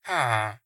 村民待机声

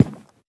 樱花木悬挂告示牌踩踏声

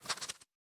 狐狸待机声

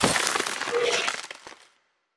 流浪者转化声

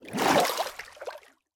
离开水下声; ambient

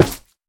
蛙明灯破坏声

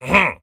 待机声; 卫道士

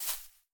杜鹃踩踏声

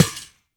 刷怪笼破坏声

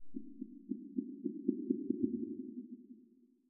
受伤传导声; 嘎枝之心

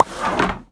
织布机拿取结果声

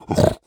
猪灵待机声

